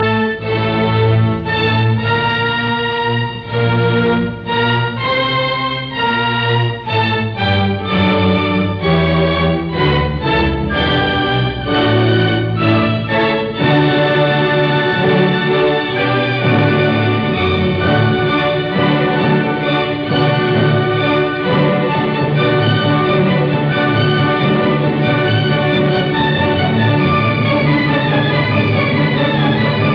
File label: Violin Concerto in D major Allegro non troppo; Brahms; Op 77